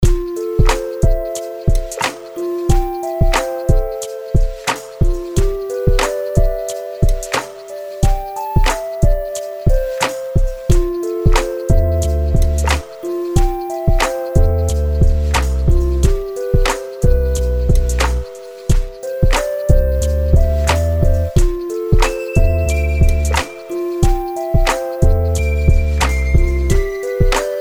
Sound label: spieluher, VINTAGE WARM MUSICBOX; We love our Uher tape machine,the sound of a music box (German: Spieluhr); CINEMATIQUE; KLANG